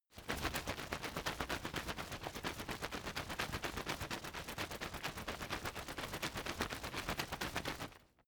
umbrella big shake fast soft

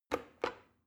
plastic button start click regular slow

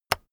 keyboard external enter release heavy

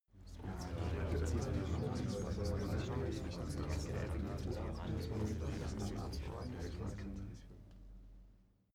male crowd mumbling

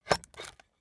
puncher squeak punch bulky

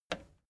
record player play push

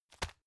tissue package drop soft

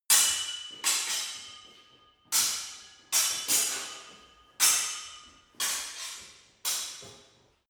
sword hit sword sequence

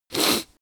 sniff female

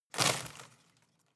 home plant leaves rustle hit

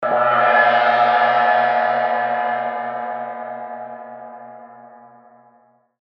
tibet sbub chai cymbal

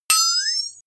boing flexatone

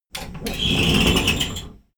train intercity train hydraulic slide door open

